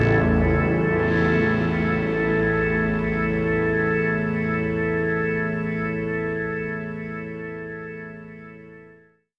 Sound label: pipe organ